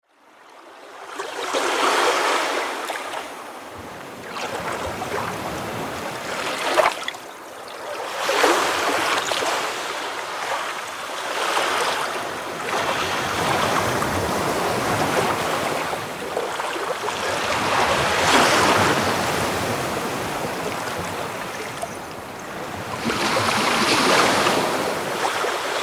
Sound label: ocean waves